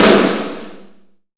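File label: Kit Heavy snare; CMI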